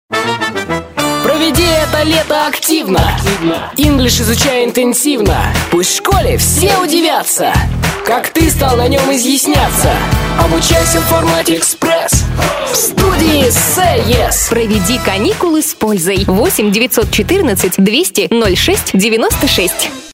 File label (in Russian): vokal